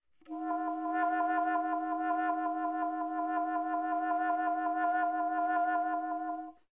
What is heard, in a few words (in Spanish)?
Bisbigliando